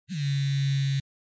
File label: Cell phone vibrating